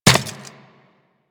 Worker Metal Leg Step Default; Warframe; Solaris

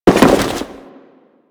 Crewman Land Default, Footsteps; Warframe; Corpus

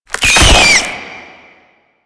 Weakpoint Appear; Warframe; Nineteeen Ninety Nine; Infested Survival

